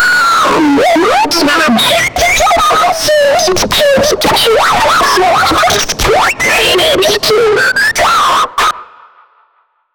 big dino squeak; dinosaur

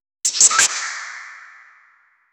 graffiti spray fx; branding

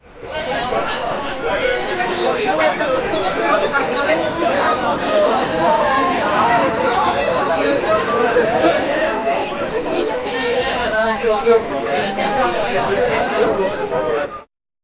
people in a club, social

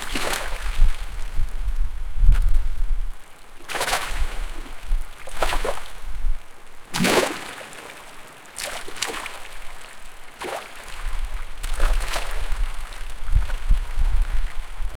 water splashing on sand, beach

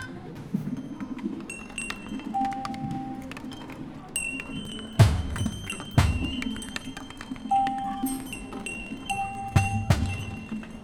cheering crowds rhythmic, festive

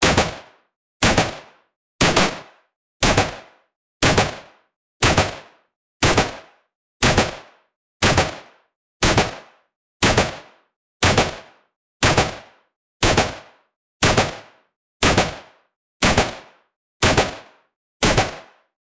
gunshots full auto rifle; automatic rifle

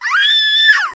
a 10 year old boy screami, trembling voice

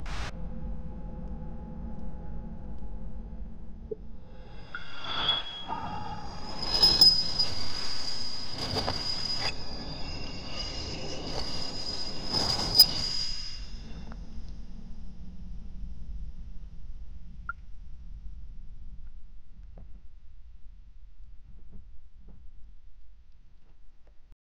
cavern

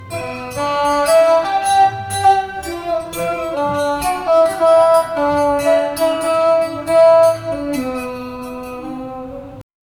a soulful solo oud, arabic

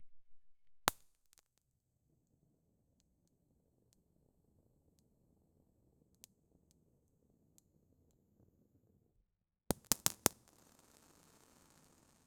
a stick of dynamite catch

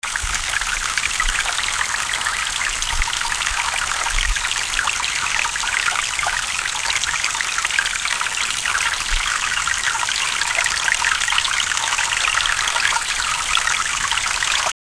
japanese garden bamboo

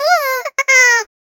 puppy crying despair

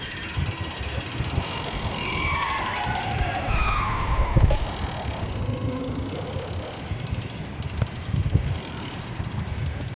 fast bike wheels spinning

